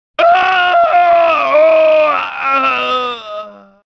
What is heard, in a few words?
crashed scream; deception; kobra